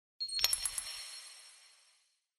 UI comform sound

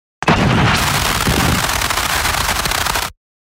Thunder element

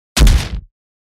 SMACK

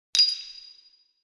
UI comform sound

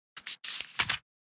FLIP A page

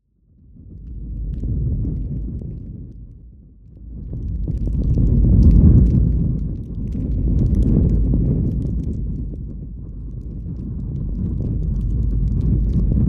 BONFIRE

